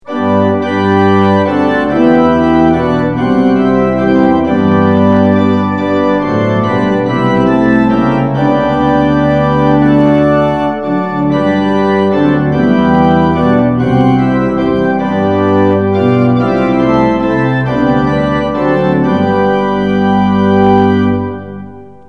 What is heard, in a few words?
tune; hymns; lillenas